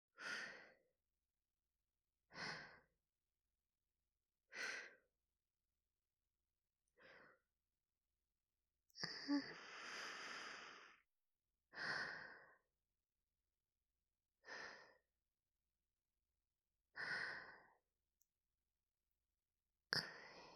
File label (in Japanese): お布団 寝息 ループ用